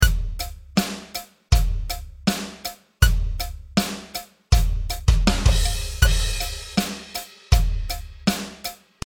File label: supremacy chorus groove fill